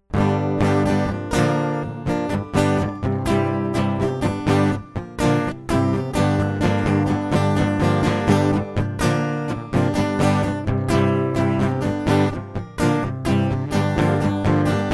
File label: acoustic